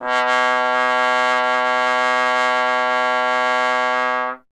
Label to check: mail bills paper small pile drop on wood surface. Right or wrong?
Wrong